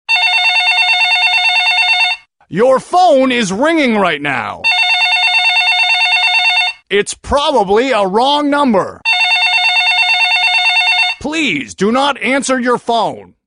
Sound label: funny tone